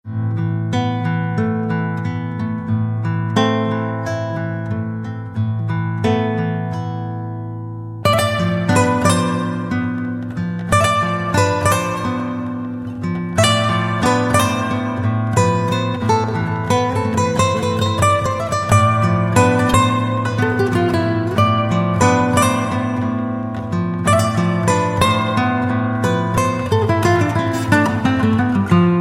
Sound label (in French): Douce Musique